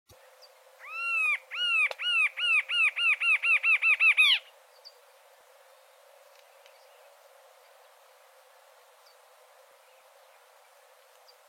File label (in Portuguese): Canto da choca de chapeu vermelho